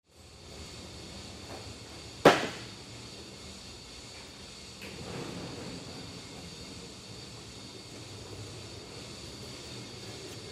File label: Auto Repair Shop Light Activity; Ambience; Auto Shops; Blastwave